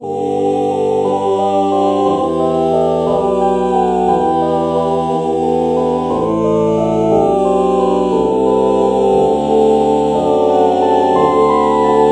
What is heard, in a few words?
oh oh morph